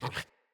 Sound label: Puppy Idle Snort; Enemies; Vocals; Kubrow